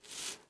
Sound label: hand slide cement